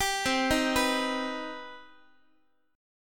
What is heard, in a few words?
C Minor Major 7th, Guitar; arpeggiated; JGuitar